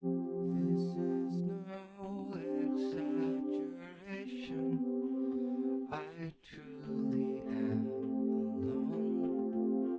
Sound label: wdrums inst sim